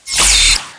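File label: phaser; Star Trek Deep Space Nine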